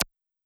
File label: Notifications and Buttons